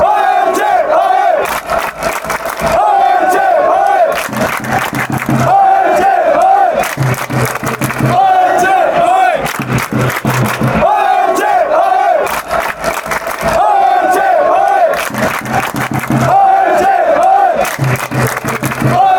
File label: ole chant